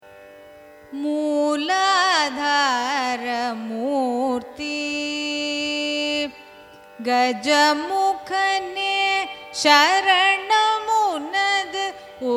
raga hamsadwani carnatic; mooladhaara moorthy

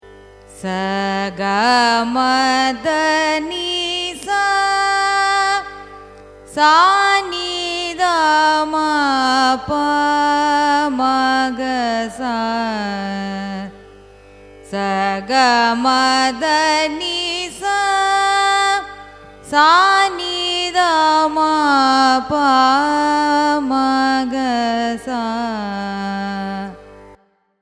raga jayanthashri arohanam avarohanam; carnatic music

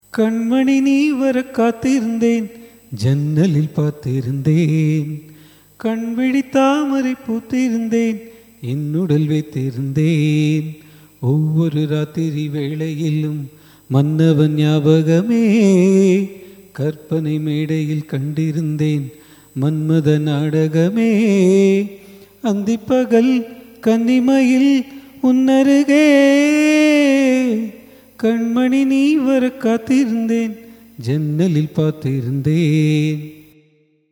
raga malayamarutham, carnatic music

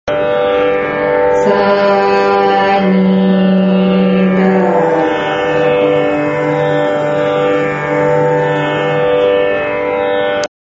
mandrasthayi, carnatic music

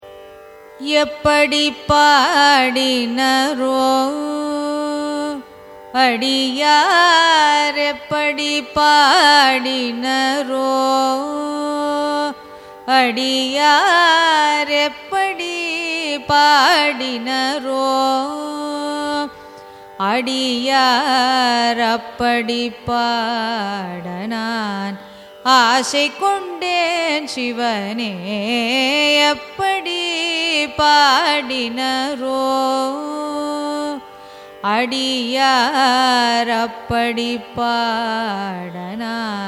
raga abheri carnatic; Arohanam: S G2 M1 P N2 S; carnatic music

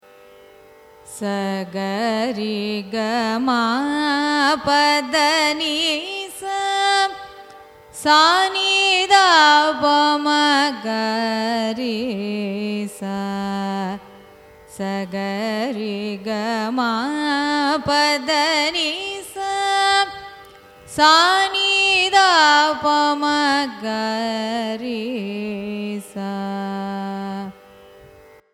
raga varali arohanam avarohanam; carnatic music

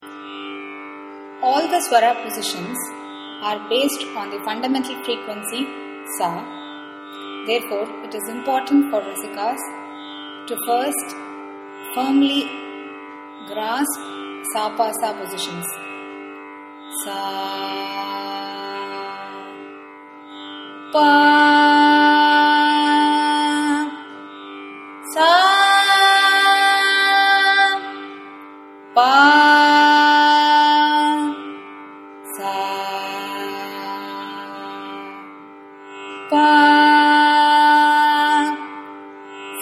sa pa sa, carnatic music

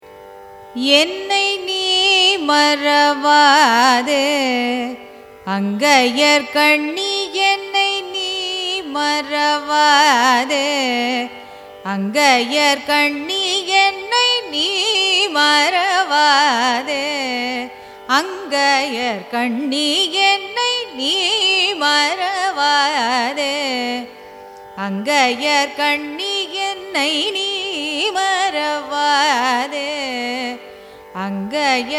raga amrithavarshini carnatic

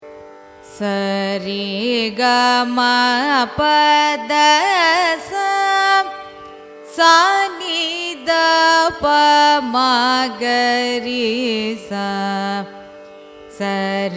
raga kambhoji arohanam avarohanam; carnatic music

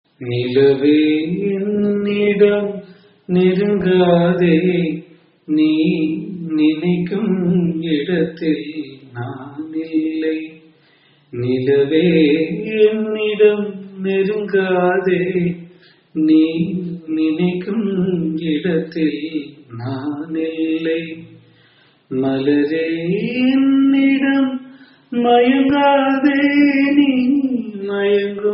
raga bageshri cine

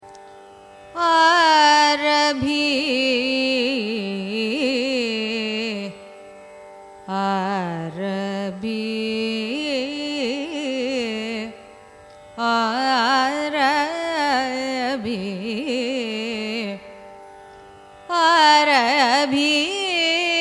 raga arabhi signature; carnatic music

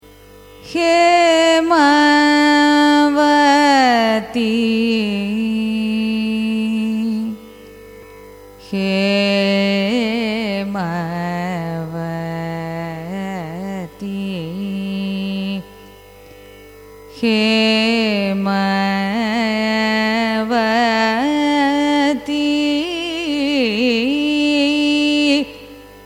raga hemavati signature; carnatic music

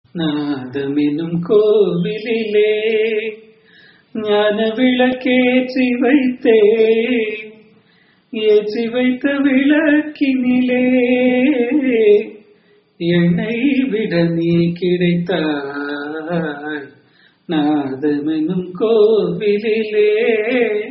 raga sriranjani cine; carnatic music

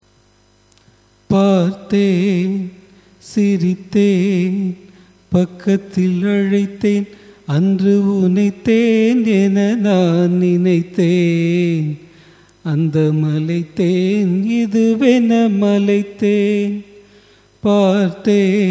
raga sahana cine; carnatic music

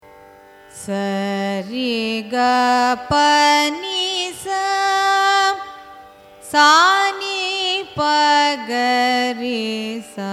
raga hamsadwani arohanam avarohanam; carnatic music; Hamsadhwani; Listening Homework: Learning Ragas

